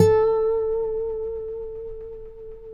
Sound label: Finger A 4 R, Guitars; Spectrasonics; Hans Zimmer Guitars VOL-1